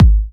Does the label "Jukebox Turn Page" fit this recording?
No